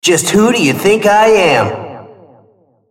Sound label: attack; RumbleSkin03